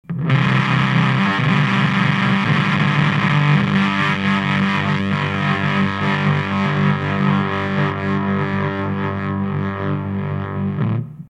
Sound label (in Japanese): FUZZ DEVIL&TOREMOLO TURTLE ON, 昔の時代劇に / 出てくるサウンドみたいです; FUZZ DEVIL」もオンにします